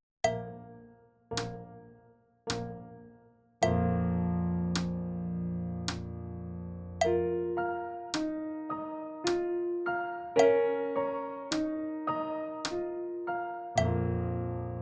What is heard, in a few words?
Piano Medium; Uebungs-CDs; Playbacks